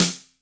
WOOD SN; Big Fish Audio; Synth City

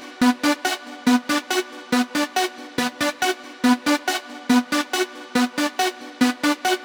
140BPM B; Vengeance - Essential Clubsounds Vol.4

